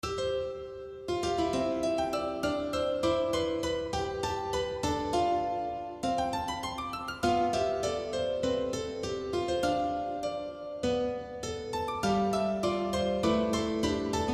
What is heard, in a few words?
piano